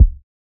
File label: Kick; Samplepack Produtique